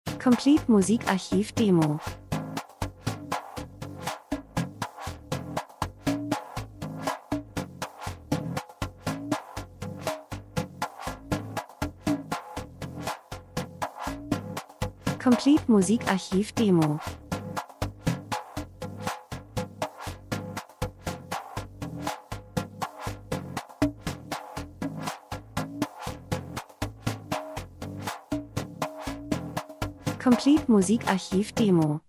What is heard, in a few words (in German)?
drums, rhythmus; Percussion einfach Traditionelle Trommeln Sounddesign Land und Leute Buschmann Reisefilm Natur Dokumentation